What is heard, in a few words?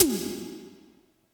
Tom Electro, Yamaha PSR E305